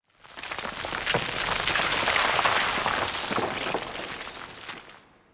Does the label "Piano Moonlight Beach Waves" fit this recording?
No